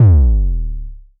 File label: C Bass, DnB One Shots